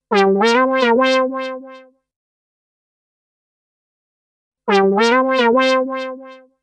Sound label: 105 Cmaj SUGARFUNK SYNTH; 105 BPM